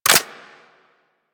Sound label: Dry Fire Rifle; Weapons